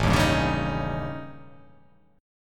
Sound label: A 7th Flat 5th; x,0,1,0,1,2,3,0